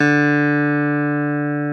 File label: clav D3; Best Service Advanced Media Trax Modern Composer